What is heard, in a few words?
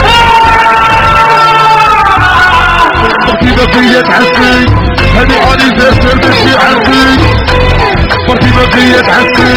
india sound